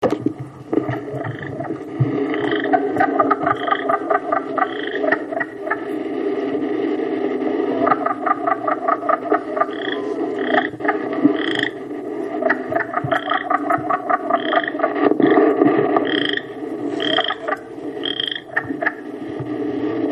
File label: wood frog